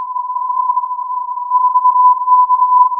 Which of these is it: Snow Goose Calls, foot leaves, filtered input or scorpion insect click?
filtered input